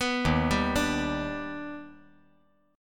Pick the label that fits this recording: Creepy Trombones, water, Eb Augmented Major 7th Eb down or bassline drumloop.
Eb Augmented Major 7th Eb down